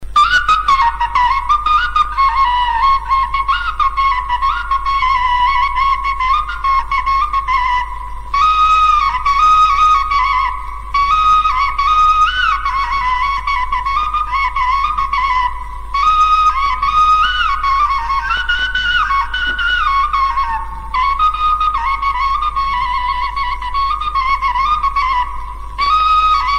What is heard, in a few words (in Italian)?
sulittu, strumenti